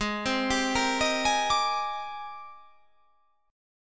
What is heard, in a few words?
G sharp Major Sharp 11th, chord